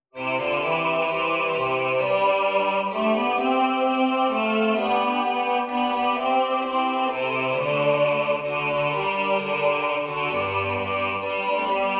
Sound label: BASS; Purcell; To the Hills and The vales